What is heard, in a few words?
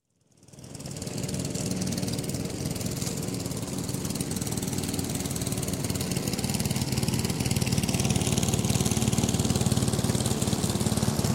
Lawn Mower Gas Cutting Lawn, Gardening; Sound Ideas; Series 2000